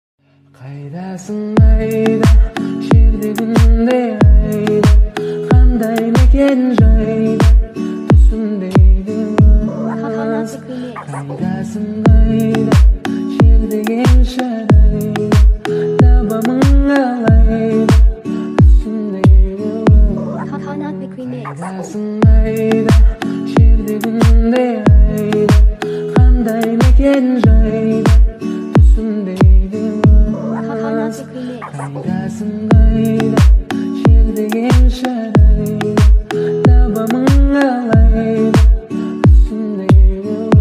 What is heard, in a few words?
remix bass boosted